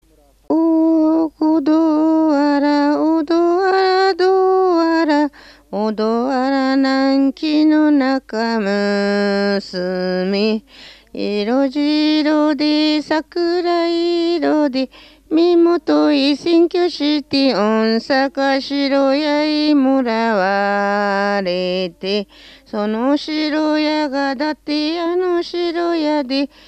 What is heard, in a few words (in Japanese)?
temariuta, minyou